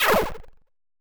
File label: Taking Item; Gloom